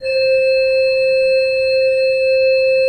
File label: SWL C4, Cathedral Organ, SCHWELLWERK; Propeller Island